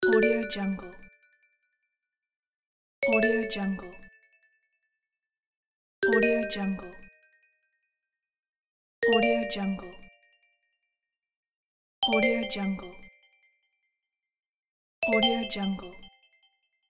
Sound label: success